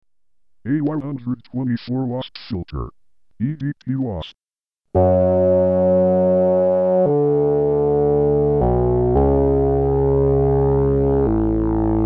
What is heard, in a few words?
Wasp LPF dry; EDP Wasp